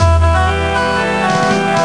Old Start Up; El-Ixir™ 3.0.3

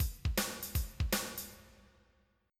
jazz; drum

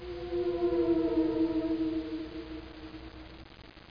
Wierd

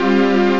green sevnth

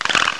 Land Die; Empire Master 2.6 Demo

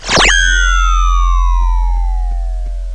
space sound

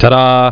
tadah, people; ClickArt 300,000

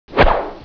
swing, weapons sword; chaos

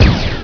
Puls fire; Hostile

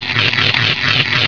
GUN

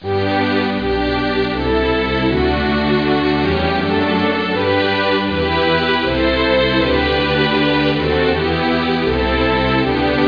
Anthem

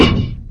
BALL; fun and bullets

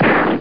GUN; Pamela Anderson Interactive

